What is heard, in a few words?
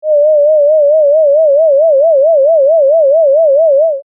vibrato